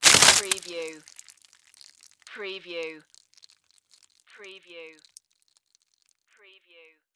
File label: Crunch Splat Juicy